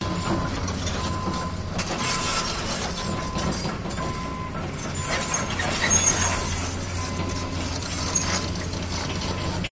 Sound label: excavators contruction site digging debris; your free sounds